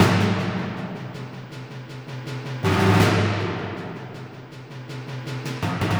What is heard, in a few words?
Snare; музыка для фильмов